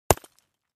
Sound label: Concrete Chunk Hit